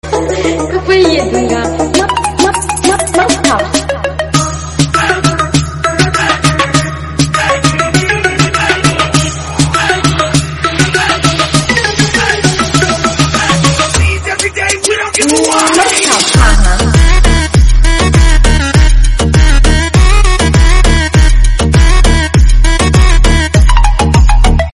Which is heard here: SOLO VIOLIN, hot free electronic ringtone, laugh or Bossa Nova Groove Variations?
hot free electronic ringtone